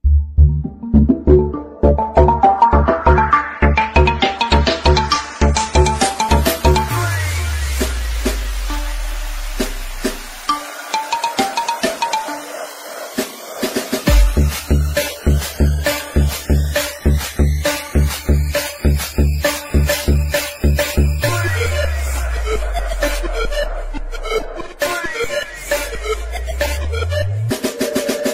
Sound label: Attitude Beat hollywood Ringtone